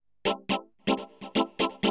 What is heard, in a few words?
SYNTH HOUSE 125 A; eJay; Keys